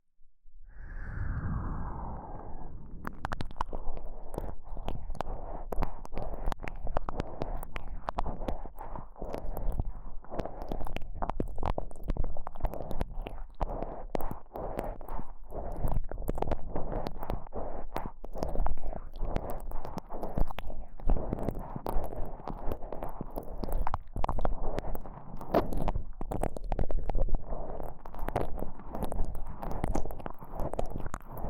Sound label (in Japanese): KU100 右耳 擦られて気持ち良い